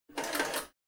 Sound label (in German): IBIS entwerter weiter; volvo 9900; Vehicles; AI volvo 9900; Freyfurt Beta